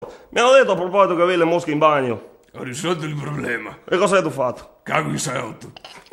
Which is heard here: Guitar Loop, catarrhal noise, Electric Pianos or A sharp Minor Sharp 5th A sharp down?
catarrhal noise